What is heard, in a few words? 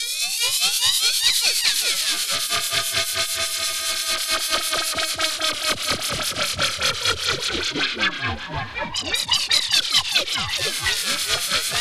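synth, Drum & Bass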